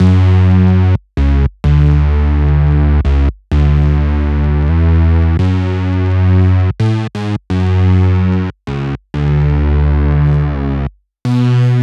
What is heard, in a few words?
Male Bass Pad; 128 BPM; Vengeance Samples; VTDS2 Song Kits